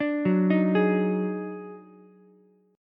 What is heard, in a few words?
Eb Minor Major 11th, Ukulele, C6; EbmM11; arpeggiated; JGuitar